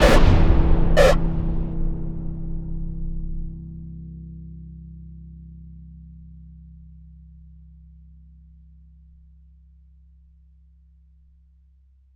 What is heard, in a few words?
STING noize bark; pinkdog; boom library; Cinematic Metal Impacts